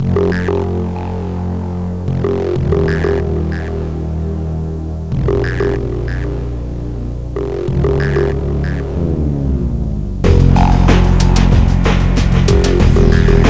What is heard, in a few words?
music